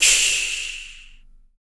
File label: CYMBAL, MALE PERC; Spectrasonics Vocal Planet; Jazz FX